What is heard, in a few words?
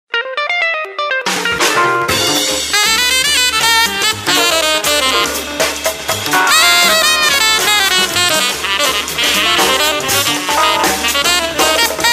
Jazz Bar